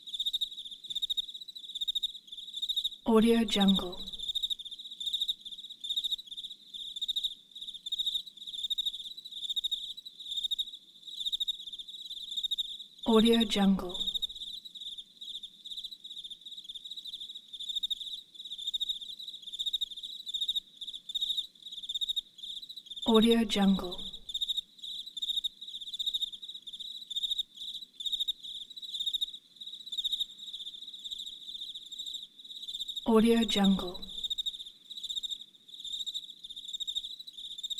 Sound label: crickets night